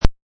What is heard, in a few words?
This joyful Eastertide, tenor; Rehearsal